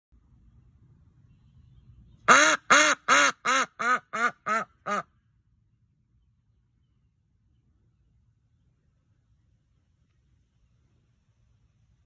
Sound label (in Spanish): pato risa